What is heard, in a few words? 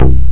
dxbass; Utilities Professional